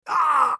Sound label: scav wound; eft